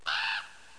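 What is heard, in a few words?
sfx raven; Ultimate Visual Basic 4; Coriolis Group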